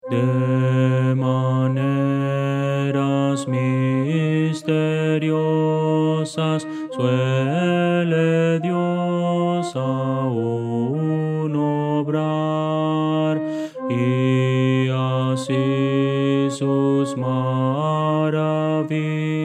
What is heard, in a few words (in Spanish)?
contralto; de maneras misteriosas